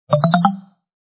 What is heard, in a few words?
typing